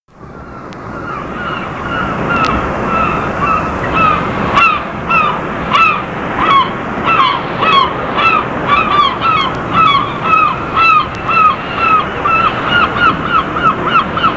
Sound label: seagulls waves; Seagulls & Waves sound effect. Used on MAR and some offshore pirates.; Mersey Pirates